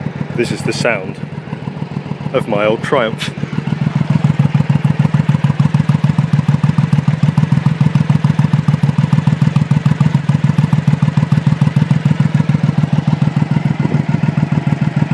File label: my old triumph